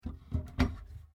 Door Pivot Interior Laminate Cabinet Open; Doors; Various doors; Miscellaneous Doors; SoundEffects Foundation2

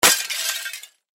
Crash Glass Smash Crash Object; Crash Glass Smash Crash Door Window Pane Small; Blastwave

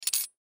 Potato Peeler Down, Potato Peeler Set Down; Food Sound Effects; Household; Foley; SoundEffects-Blastwave

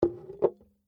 Whiskey Bottle Down, Set, Empty, Slow; Foley; Drink Mixing; BlastWave

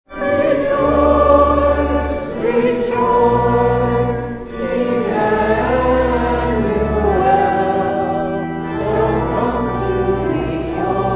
Congregation sings Rejoice Rejoice Emmanuel; Rejoice Rejoice Emmanuel Hymn; Churches (Sound Effects:Backgrounds)

